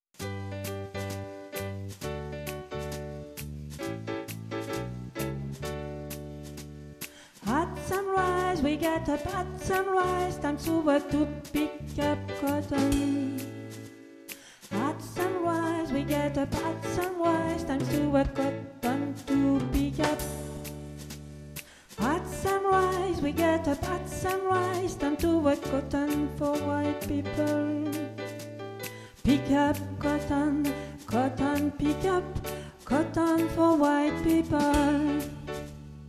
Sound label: alto